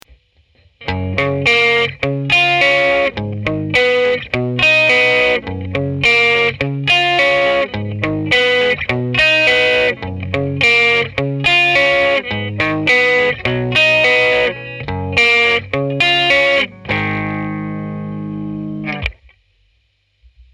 E Guitar Picking Tweed Delight

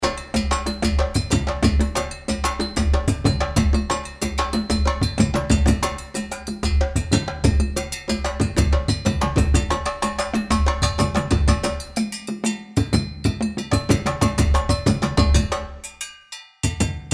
West Africa Ensemble Dundunbe

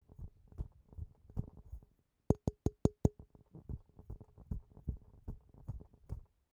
DPA 2028 Handling Noise

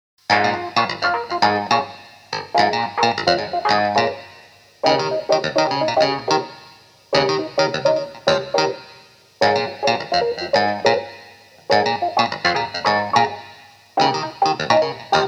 Hohner Clavinet D6 Clavinet Mix

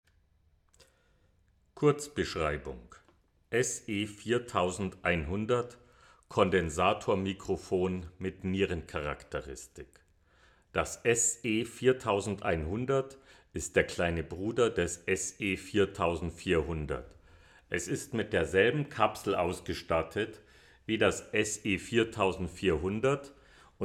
SE4100 Lewitt

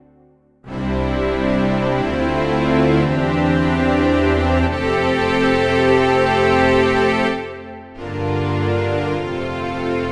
Yamaha Str

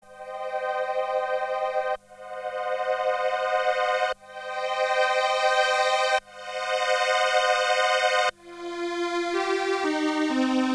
Strings; Polychrome

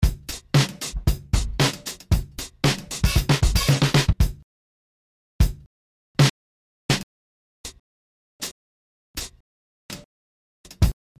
Loop into Kit

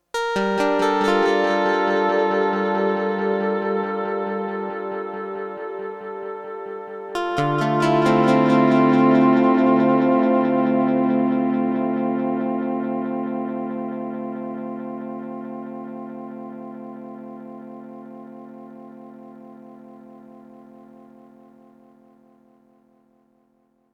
AMS dmx 80S LFO2

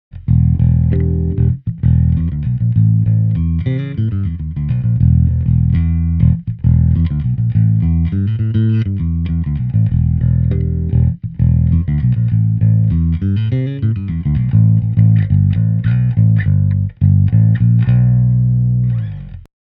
Rupert Neve Designs Portico 5017 Bass amp TLM 103

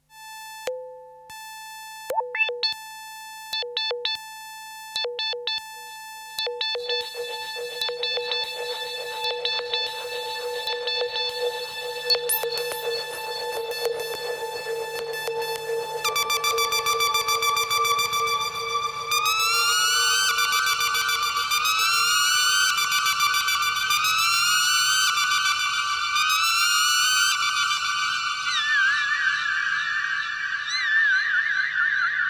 Lexicon PCM70 Psycho Echoes Synth

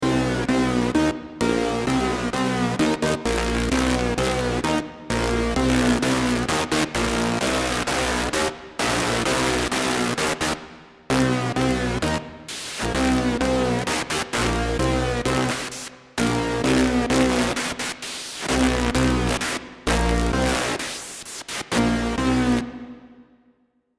Virus TI2 Sawtooth Fold

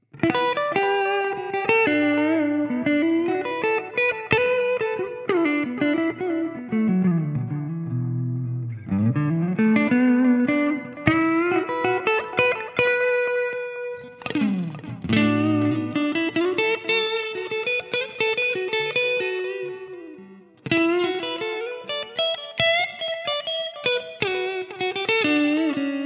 Harley Benton DNAfx GiT Pro Ecstatic Clean

